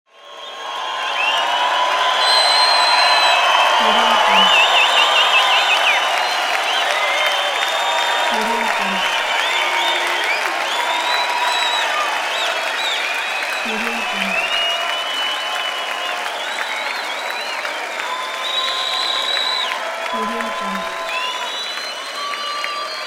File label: crowd cheers and ovations